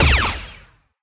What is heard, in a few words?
zap; Geschicklichkeit; Bricks Of Egypt